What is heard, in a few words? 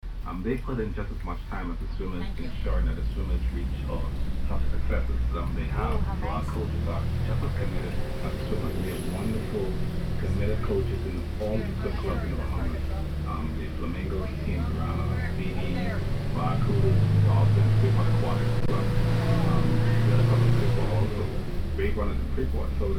jitney ride, bahamas; Heaven & Hell in Paradise